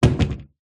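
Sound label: Cabinet Door Shut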